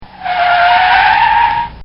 Volkswagon Hard Stop; Transportation

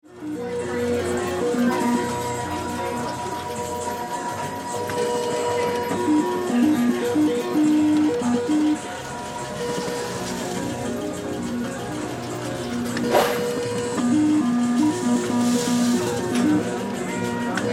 Ambience Casino Slot Machines Crowd Walla

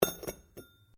METAL ON STONE IMPACT GENTLE

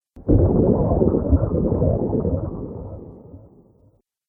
Underwater Element, Water; nature

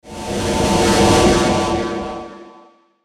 static low; Static Low Whoosh; Production Element Imaging Element Accent Transition; Blastwave; Static Sound Effects

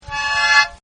Harmonica Notes; Musical

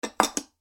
Dessert Bowls Stacked Together Noise; Dishware Sound Effects; Household Sound Effect

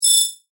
Multimedia Chime, Technology, Multimedia, Chime

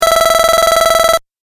8 Bit Style Rapid Blips; Video Game; Video Game Sound Effects

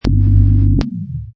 Hi Tech Panel Servo Button; High Tech Sound Effects; Lynne Music

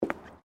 FOOTSTEPS WOMENS LOW BOOTS BOARDWALK STEP; Footstep Foley; Footsteps (Sound Effects:Human)

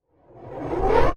Ascending Whoosh Abrupt End; swish, sweep and swoop

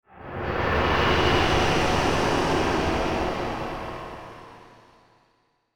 Whoosh Soft Fuzz Pan; Blastwave

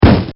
Battle; Battlefields (Sound Effects:War); This sound effect was recorded in a custom built sound booth

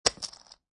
Poker Chips Multiple Dropping On Felt

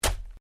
Organic stab; Various Organic Foley sounds; Stabs and Slices; Combat

